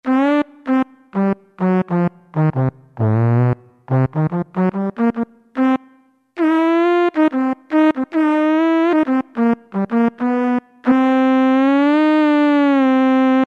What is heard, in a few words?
Trombone; Roland D-110